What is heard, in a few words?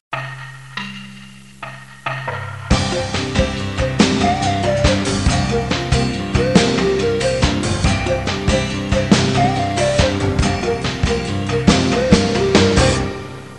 jungle; army; music